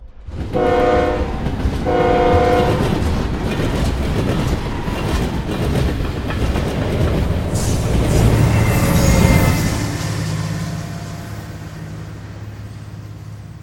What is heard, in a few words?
train pull away